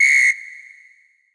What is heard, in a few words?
Whistle L, percussion reverb; MARKYDRUMS